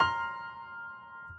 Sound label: piano sus